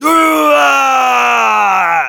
Heavy yell fr

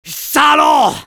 Medic negative vocalization, fr; Salauds !

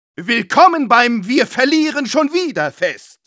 medic jeers, de

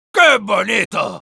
Sniper cheers, es; ¡Qué bonito!

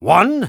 exert; Раз!; Spy; taunt rps

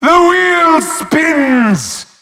wheel spin

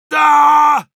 pain severe, ru; Heavy

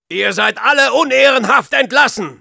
jeers, de; Soldier; "Ihr seid alle unehrenhaft entlassen."

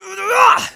taunt exert; Scout

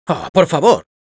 Spy negative vocalization, es; "¡Oh por favor!"

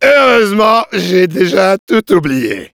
demoman jeers, fr; "Heureusement, j’ai déjà tout oublié."

